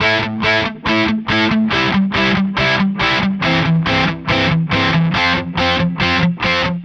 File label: Distorted Guitar 140BPM Amin; Vengeance - Essential Clubsounds Vol.4